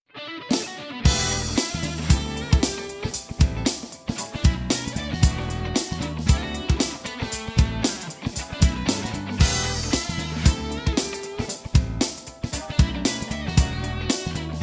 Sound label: Funk; Funkpot